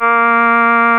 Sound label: REED A#; Big Fish Audio; Synclav FM